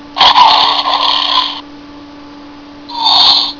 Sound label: roar; supreme cheetor